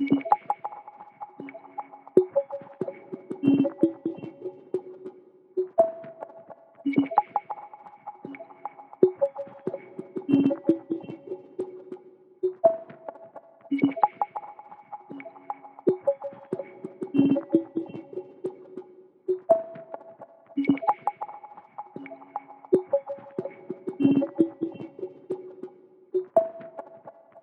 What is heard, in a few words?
140 BPM C# Min Blips, melody loop stem; Loop Stems; Cymatics; 2022 Melody Collection; Wavy; Drift Away